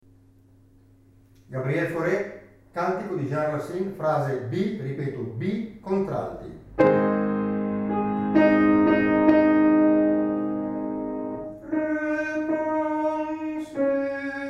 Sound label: Contralti; Faure; Racine; Frase B